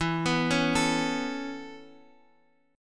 E 6th Suspended 4th

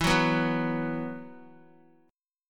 E Suspended 2nd, Guitar; Esus2; strummed; x,7,6,4,6,x

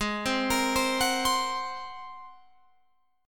G sharp 9th, Guitar, Open F; G#9; 20,19,22,19,21,19; arpeggiated

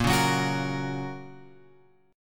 A sharp Minor Sharp 5th; A#m#5 Chord {6 4 4 X 7 6} strummed

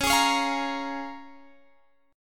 Db 6th

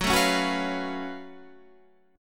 F sharp Minor Major 11th, guitar; chordshape; down

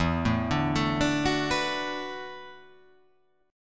E 7th Suspended 2nd Suspended 4th, down

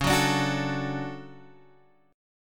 D Major C sharp, guitar

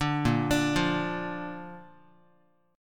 A sharp Major, Guitar; arpeggiated; JGuitar

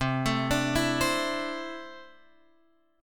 C 6th Add 9th C down Guitar Open F; arpeggiated; JGuitar

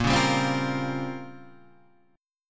A sharp Minor 6th Add 9th A sharp, Guitar chord; A#m6add9 Chord {6 4 5 5 6 6} strummed

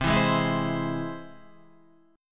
C Augmented, Guitar, chord; strummed; JGuitar

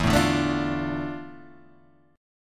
D Minor E, chord; Dm/E strummed; JGuitar

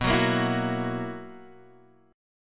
B Major 7th Suspended 4th B, Guitar, Standard; strummed; x,2,4,3,5,2; JGuitar

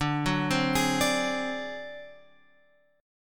D 7th Flat 5th D, chord; D7b5 Chord {10 9 10 X 9 10} arpeggiated

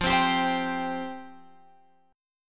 F Suspended 2nd F Guitar Open F; Fsus2; strummed

